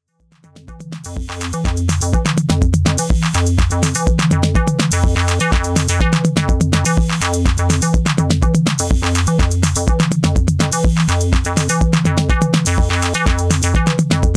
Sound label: Clap N Beat; House